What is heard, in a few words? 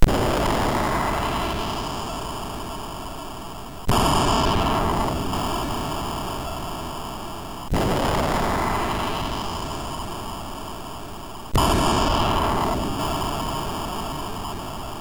Short intro tune, MOS6581; hvsc